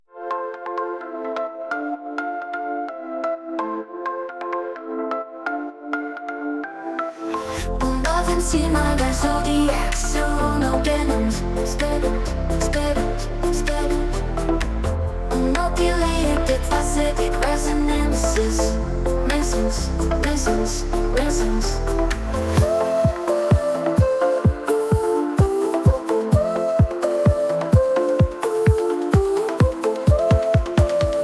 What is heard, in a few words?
instrumental future; Лохматый композитор